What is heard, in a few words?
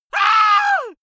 scream male; comedy-cartoon; soundpool